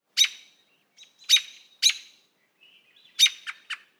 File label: bird; Counter-Strike Global Offensive